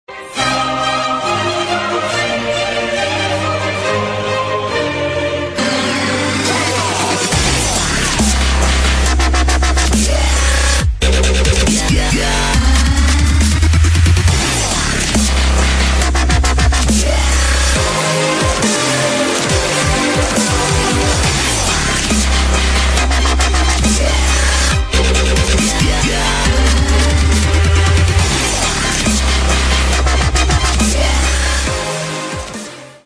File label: dubstep gun song; saints row iv